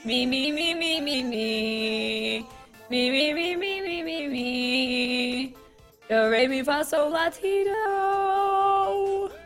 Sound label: scales Autotuned